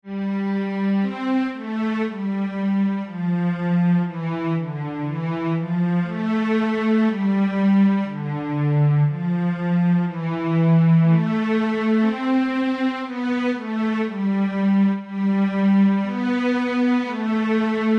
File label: strings, hymn